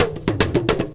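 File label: big tama groove; Best Service; World Colours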